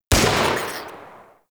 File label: Inf Uzi Shot; Infested; Weapons